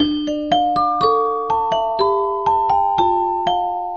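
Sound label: keys magic box; loops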